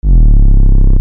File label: bass; neptune